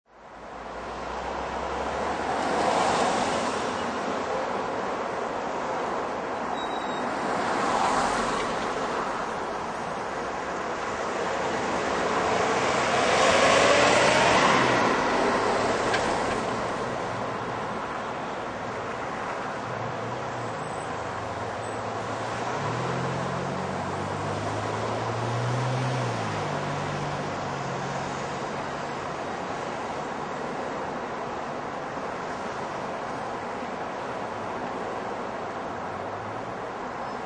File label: Busy Intersection Traffic - Close To Traffic Perspective; Busy intersection traffic. Close to traffic perspective. Cars driving by at slow to medium spee